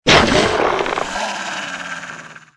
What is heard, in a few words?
death